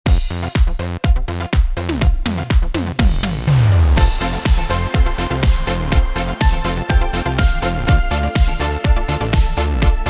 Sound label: Midi Yamaha